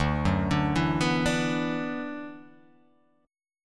D 6th Add 9th D

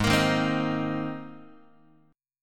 G sharp Major Add 9th, Guitar; G#add9 strummed; JGuitar

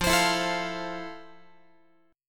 F Major 7th F, Guitar; strummed

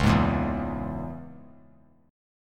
C sharp Minor 6th; C#m6; strummed; JGuitar

